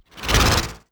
Break Mono Jolt

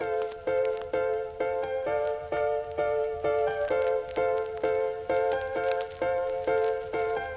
Classic Melody Loop 130 BPM D Min Keys; Melodics; Loops; Loop Stems; Cymatics; Eternity

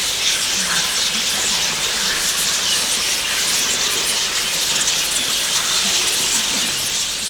wisper szszs

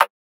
Perc; Facetime Perc; Cymatics; Lofi Starter Pack

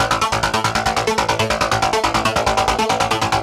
A 140 BPM, Psytrance Arp Loops; Bypass

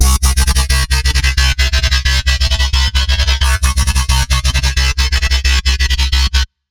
Bassline C3 140, Dubstep; GhostHack

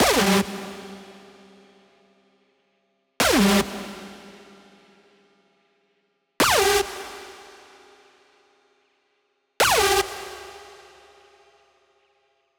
Screech G 150BPM, Rave; Techno; Melody Kits; Stems; GhostHack; Ghosthack - 7 Free Rave Melody Kits 2025; Kit Chaos - G 150BPM

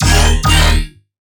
Melodic Bass D# 140; One-Shots; Bass Hits (Melodic); River; Ghosthack; Color Bass Essentials

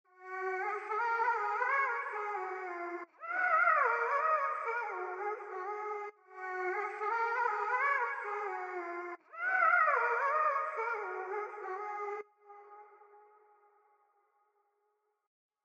157 BPM D# Min Vocals; RnB; Melody; Loop Stems; Cymatics; 2022 Melody Collection; Free Your Mind

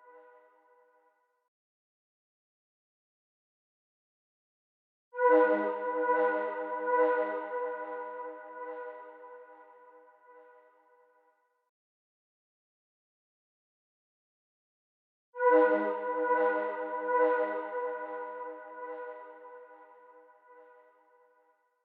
94 BPM G# Min Accent; Melodies; Loop Stems; Cymatics; GHOST (Beta Pack); Freedom

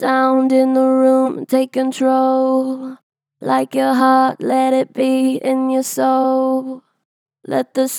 Str Flat, Vocals, Pitch Flat Strong; Harmor Vocals

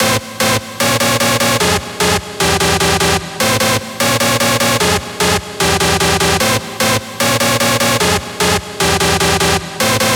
Stab Cm 150BPM, Techno; Rave; Melody Kits; Stems; GhostHack; Ghosthack - 7 Free Rave Melody Kits 2025

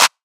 Chunk Clap; House; Drum One Shots; Claps; Cymatics

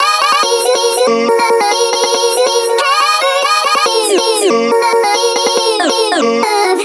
Vocal Chop Loop F# 140; Vocal Loops; Ghosthack Advent Calendar 2018 Day 9

